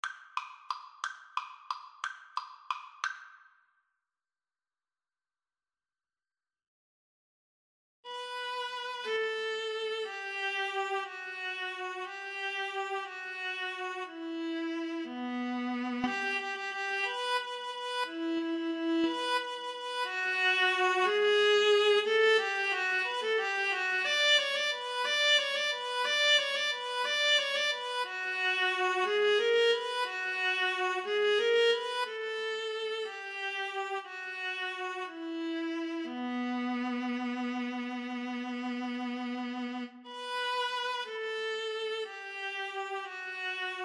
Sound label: Violin Viola Duet; carol of the bells